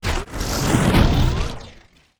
wooshes transform sweetener

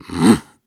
Grunts and Chants, Viking Vocal

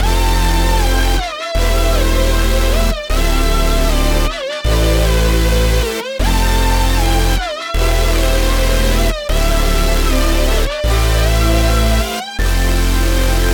155 BPM A Min, Hyperpop; Supernatural

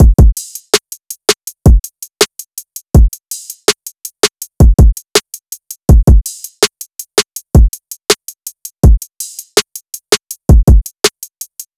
Drum Loop 163 BPM, Trap; Blizzard; Cymatics; ANALOG EVOLUTION Beta

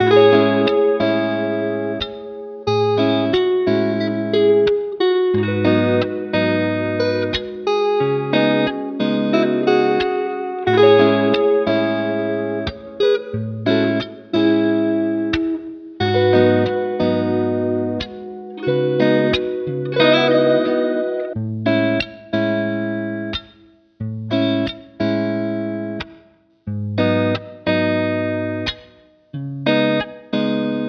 Flowers, 90 BPM C# Min, Guitar Loops; Cymatics; Journey Guitar Loops

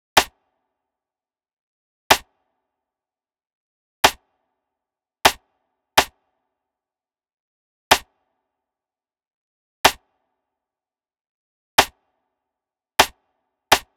Drum Loop 124 BPM Rim; Cymatics; Vibes II Drum Loop Collection; Aftermath

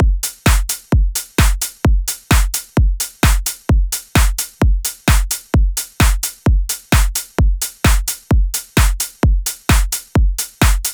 Drum Loop 130 BPM; Steam; Cymatics; DOPE DRUMS VOL. 1

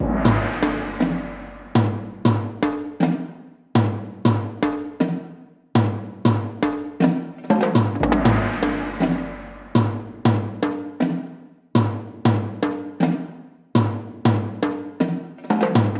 Toms 120BPM; Ghosthack; Kit 11 - Natural Selection; Percussion Loops

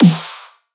Dubstep Snare; Ghosthack; Dubstep Sound Arsenal

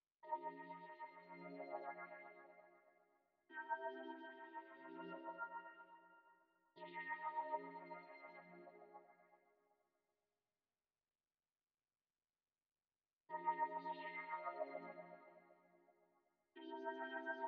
147 BPM F Min Chords; Melody; RnB; Loop Stems; Cymatics; 2022 Melody Collection; Limelight

